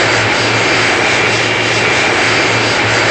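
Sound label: Engine Thrust Exterior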